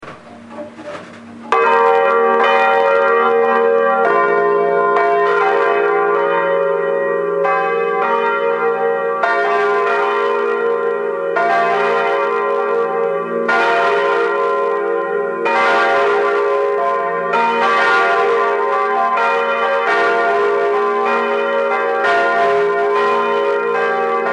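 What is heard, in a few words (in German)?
volles geläut; neues wahrener gelaeut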